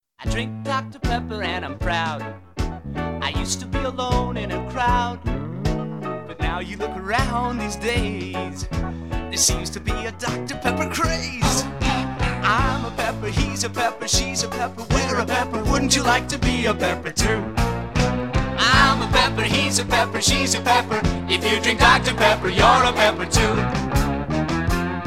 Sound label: Jingle